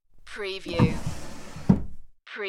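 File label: Dresser Drawer Shut; Sounds in the home of drawers being open or shut.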